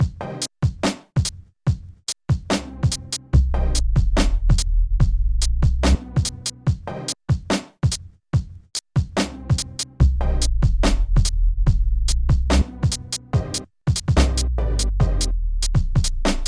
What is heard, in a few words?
Dubsteppa lite